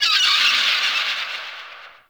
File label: Corrupted Beach Seagull Day; Ambience